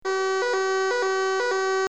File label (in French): alarme incendie